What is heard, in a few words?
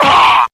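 "ah" martial arts guy, Voice; ooh ahh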